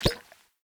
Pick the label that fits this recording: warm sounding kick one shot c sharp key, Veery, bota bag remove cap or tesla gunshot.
bota bag remove cap